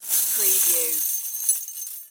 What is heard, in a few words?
Chain Pick Up